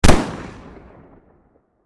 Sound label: Pistol Smith Wesson 357 Single Shot Exterior; Warfare